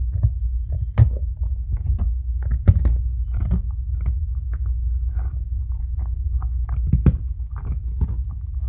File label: trex bite loop